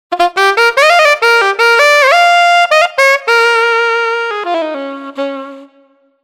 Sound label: Alto Sax; TURBO VL